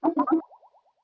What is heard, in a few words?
incoming voicemail